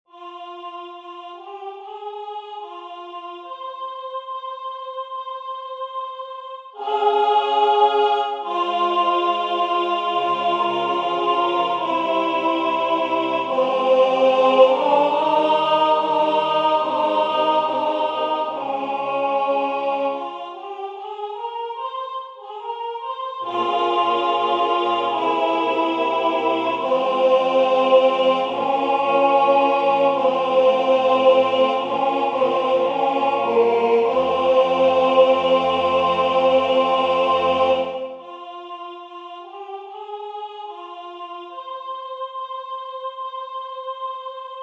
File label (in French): alto; Entre le boeuf et l ane gris